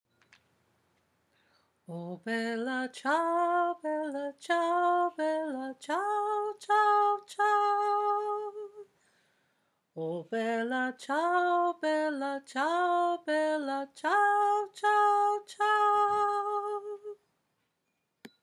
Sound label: High Chorus harmony; Bella Ciao